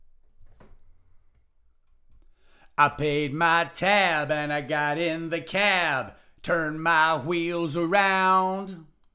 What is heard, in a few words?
vocals